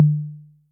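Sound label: Analog Low, Electric Tom, Yamaha DTXpress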